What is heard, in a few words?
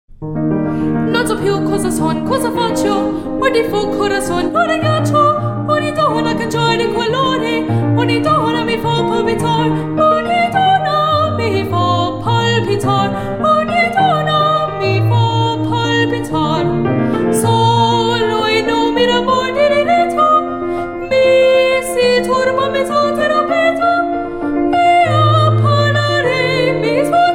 High School Soprano; Non so piu; Marriage of Figaro; Mozart